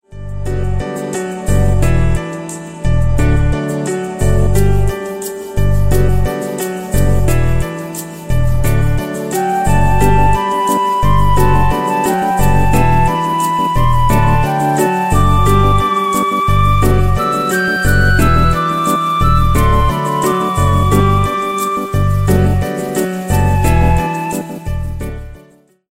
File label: smooth melody ringtone